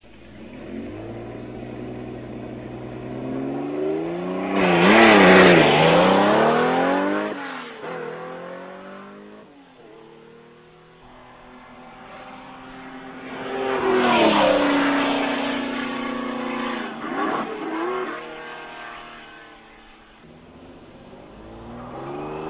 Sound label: Ferrari 512 Bblm, motorhangok; autosport